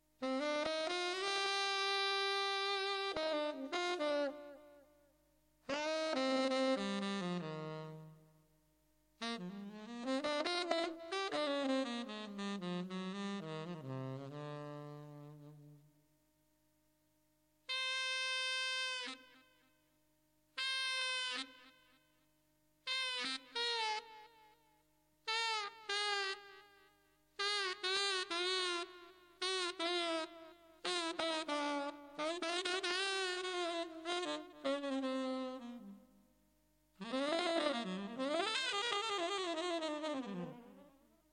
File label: sax solo